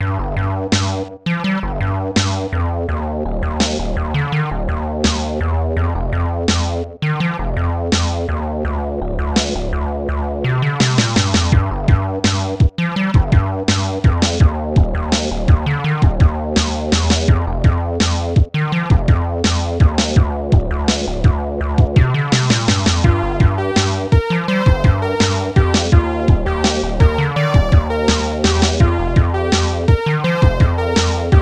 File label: synth fade